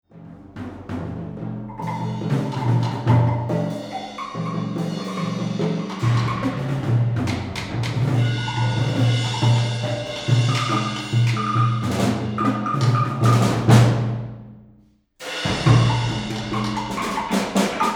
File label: Music For Percussion Ensemble